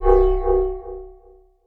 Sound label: Sfx, Trance; Zero-G Trance Inducer; General Sfx